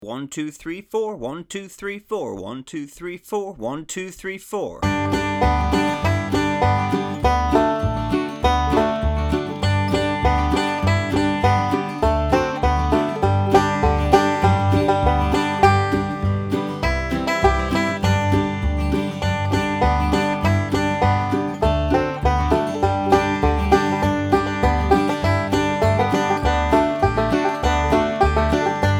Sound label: Beginner Banjo 100 BPM; Camptown Races